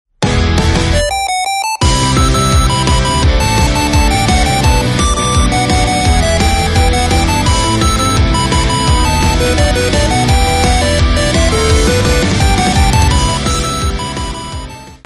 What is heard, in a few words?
8bit